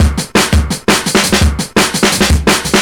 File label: Breaks 170, Drum&Bass; EZRollers